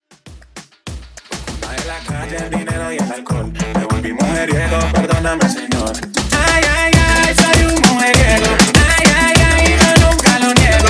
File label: Latin Reggaeton Party Clean Intro 99 BPM